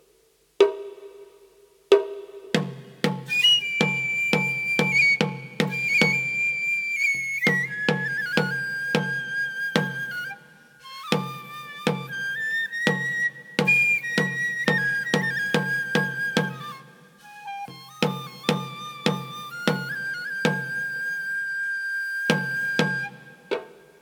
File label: Toori Kagura, taiko